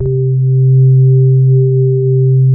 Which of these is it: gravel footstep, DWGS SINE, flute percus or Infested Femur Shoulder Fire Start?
DWGS SINE